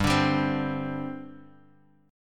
G Suspended 4th

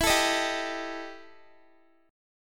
E Minor Major 7th Double Flat 5th, Guitar; strummed

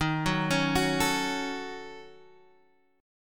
Eb Minor 7th Flat 5th Eb down Guitar Standard; Ebm7b5; arpeggiated; JGuitar

